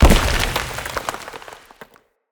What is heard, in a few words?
Stone Impact; Powers; Fey; Ward